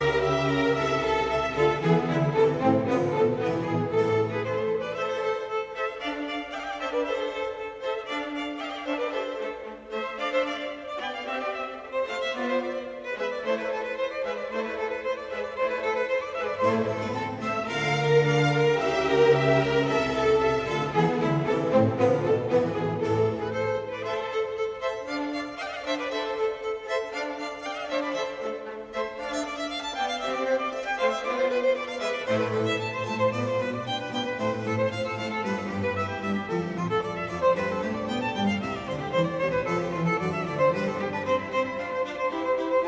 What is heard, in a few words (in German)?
concerto a moll op 3 nr 8 fuer zwei violinen und orchester allegro